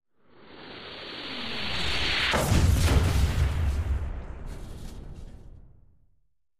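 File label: incoming explosion, artillery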